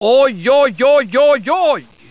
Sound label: OJOJ